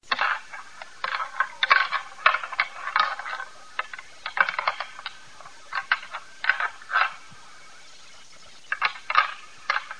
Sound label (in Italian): cervo